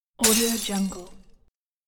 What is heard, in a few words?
glass breaking